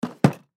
Briefcase Set Down; Miscellaneous Office; Offices; Blastwave

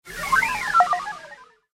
Fast Droid Flyby

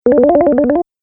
Zippy Bubble Bonus, video game sound effects

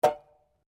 SLEDGEHAMMER METAL IMPACT MEDIUM; Working with a sledgehammer; Hammers; Tools

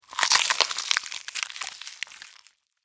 Insect, Eat, Chew, Bite, Bug; Rip, Tear, Flesh; Insects Eating; Blastwave; Animals:Insects

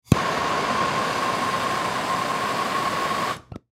Hand Held Torch On Run Off Short; Hand Tool; Industry; Blastwave

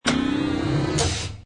Hi Tech Panel Servo Button; High Tech Sound Effects

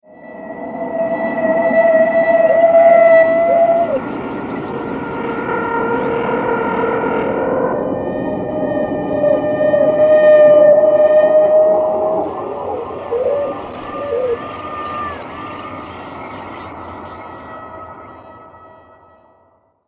Screams Horror Atmosphere, scary soundscape

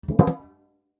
Popcorn Tin Large, Popcorn Tin Large Tap Buckle; Food Sound Effects; Household; Foley; Blastwave

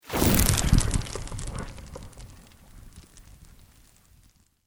Sweep Organic Medium

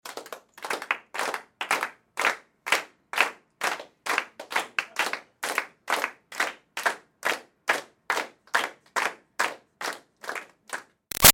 Crowd Small Claps To the Beat Loose; Interior; Applause Sound Effects; Human; Big Room Sound

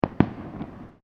Fireworks Multiple Firecrackers; Backgrounds; Crafted Signals

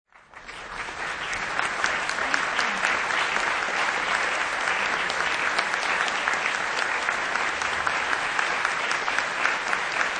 Concert

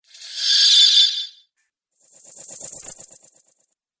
Magic Spells; Magic Sound Effects

